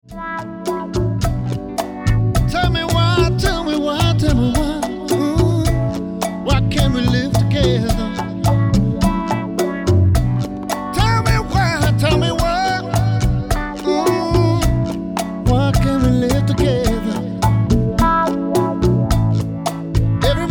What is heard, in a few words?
Lounge and Covers In Store Radio Background Music